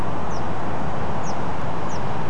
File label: Buff bellied Pipit calls; nocturnal flight call; Beijing